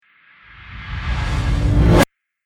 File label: Trailer Riser Abrupt End, Rise And Dive Sound Effects